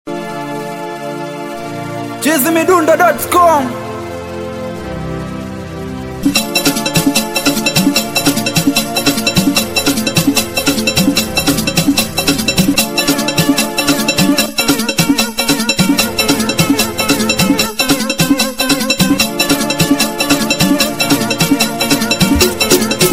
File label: Bonzo Beat Singeli